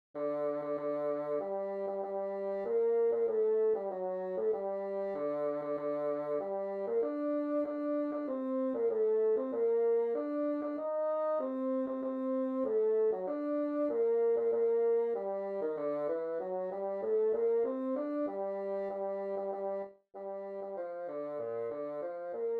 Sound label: Bassoon